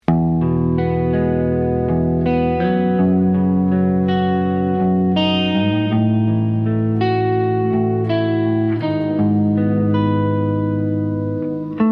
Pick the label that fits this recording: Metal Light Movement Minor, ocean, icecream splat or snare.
ocean